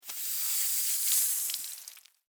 Spider Web Descend, Spider, Web, Descend, Spool, Arachnid, Insect, Bug; Spiders; Animals:Insects; Sound Effects:Animals:Insects; Blastwave